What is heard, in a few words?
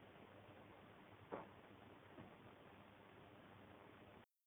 Knocking Noise; hopewell residence